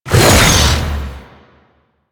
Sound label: Flame Coil Ability Shot B; Warframe; The New War; Archon; Amar; Abilities